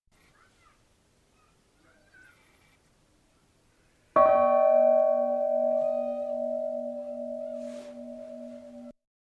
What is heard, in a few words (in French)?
cloche; St Eloy la Glacière